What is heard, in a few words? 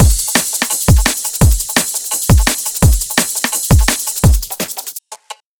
Freaky Beat, drumbass